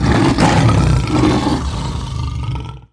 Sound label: stygian lion die; DAoC; Toa; Gamestar